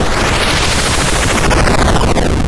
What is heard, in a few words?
exp cluster, Explosions